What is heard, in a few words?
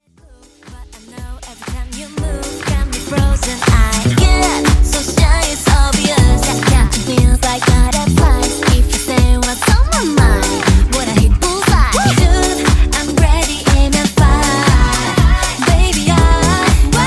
Disco Mashup; Top40